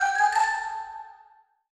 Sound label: Ladder